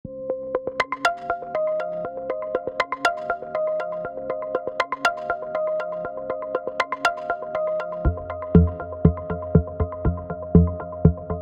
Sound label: Random LFO Pulse; Vital LFO